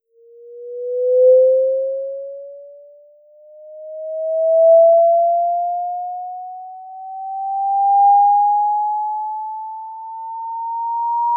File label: chirp